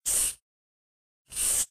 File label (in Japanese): pencil batu; ペン０１ ペンで×をつける。シャー、シャー。